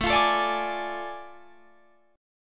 A Major 7th Suspended 4th Sharp 5th, Guitar, Drop D